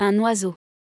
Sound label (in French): un oiseau